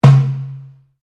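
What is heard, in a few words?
Drum Darabuka Palm Hit forte, Egyptian Darabuka Drum; Commanly available Egyptian Darabuka Drum; Musical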